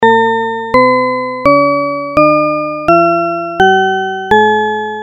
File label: scale